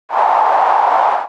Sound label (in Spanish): banda Ruido